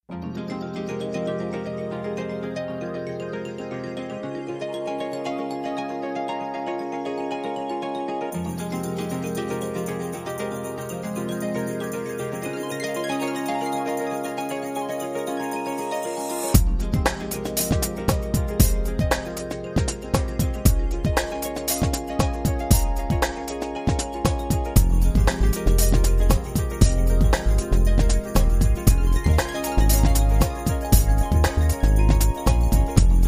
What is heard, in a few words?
Chill The Funk